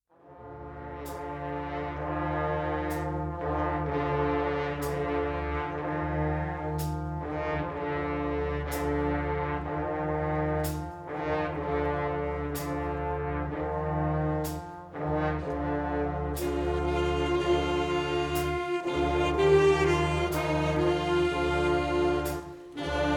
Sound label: hymn